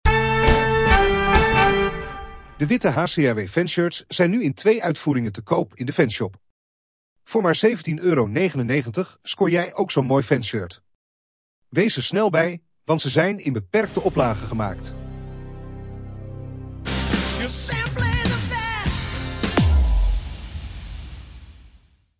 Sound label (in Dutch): korte jingle; Fanshirt; Game Songs